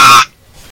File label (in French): crie d'oiseau cry bird